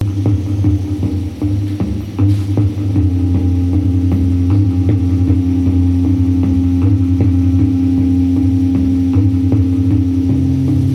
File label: Electronic Recordings From Maui Jungle; Editions Mego